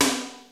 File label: rim tom, Roland JD-990